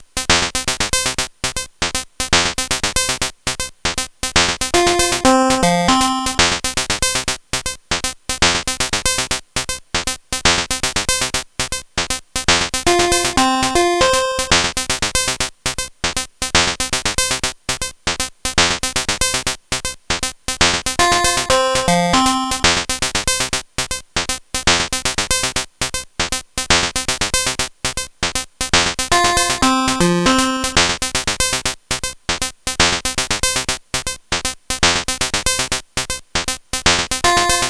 Nanoloop

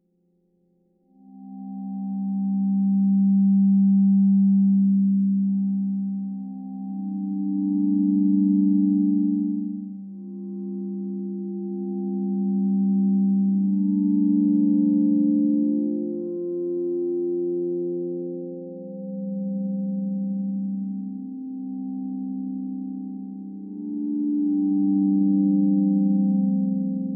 Chorale

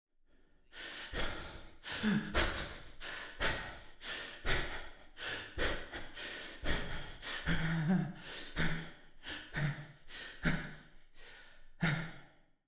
Heavy Breathing Scared Male

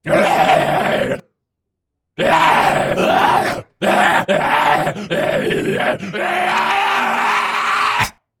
Hero Zombie

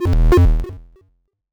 Pulse

